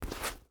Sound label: ui pickup leather; Rust